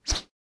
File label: lobby victory swish; smite; kukulkan